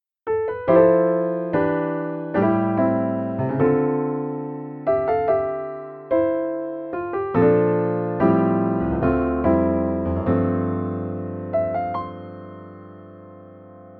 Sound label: piano